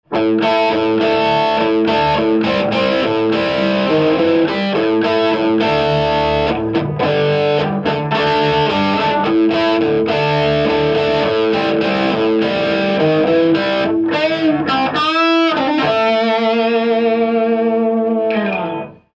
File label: mesa boogie, amps